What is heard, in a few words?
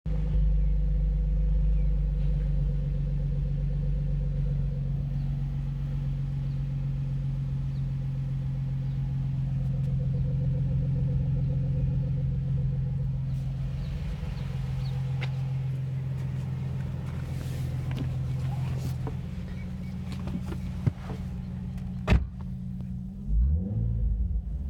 350z exhaust; gamer